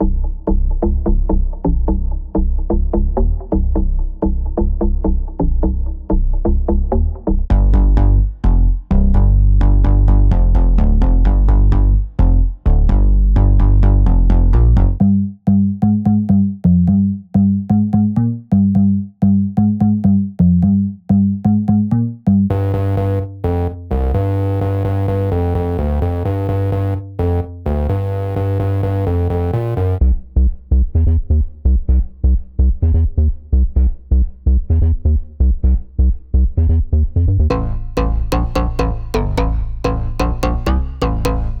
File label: ghost bass